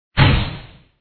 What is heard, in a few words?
black widow attack contact bottom